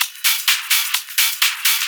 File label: Hihat Loop 128 BPM